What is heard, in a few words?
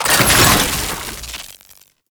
Alchemy Grenade Deposit Electricity; Entrati